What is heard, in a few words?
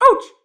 hurt, creature